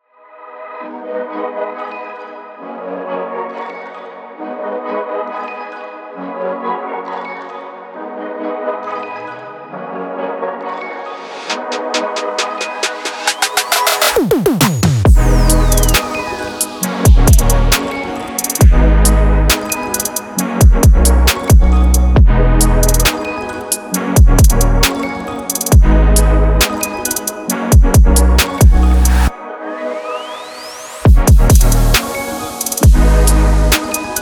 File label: (Instrumental)